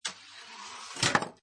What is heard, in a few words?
Pinball Sound Ball Travels On Rail; Video Games (Sound Effects:Games)